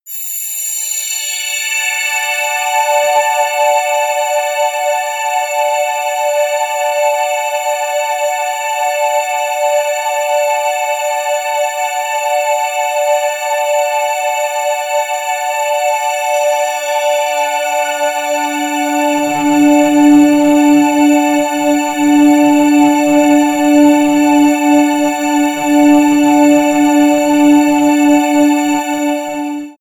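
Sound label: morning air, pads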